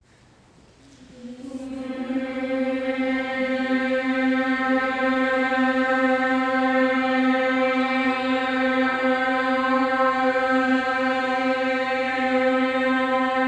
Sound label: overtone singing rehearsal, women's voices chorus